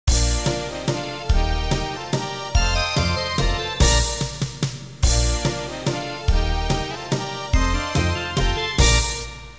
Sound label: waltz; QChord